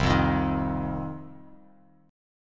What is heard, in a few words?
C Minor Double Flat 5th C down Bass Standard; Cmbb5 strummed; JGuitar